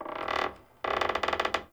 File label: Dendrit Structure Wood Creak Small; Ambience; Fort